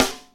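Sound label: snare Waver, EMU Orbit-3 drum machine; 300 Drum Machines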